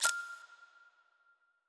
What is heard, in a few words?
Future Sounds, Interface Futuristic; ArmyRaid